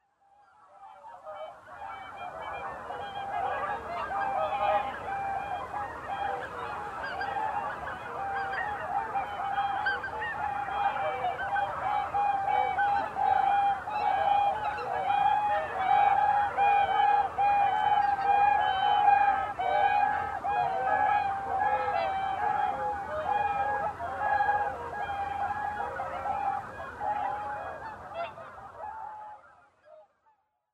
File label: oohakuchou